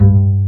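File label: double bass G-2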